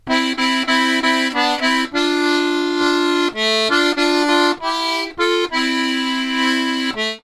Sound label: Complete Accordions; USB Soundscan vol.40; AKAI